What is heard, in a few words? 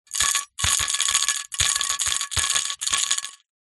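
sounds of hamsters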